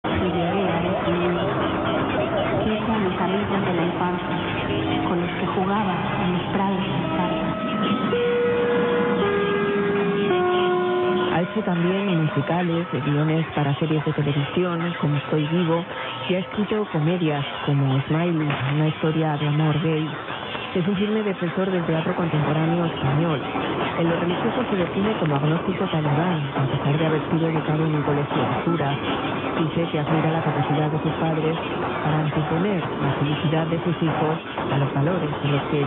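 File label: RNE Sevilla Spain, radio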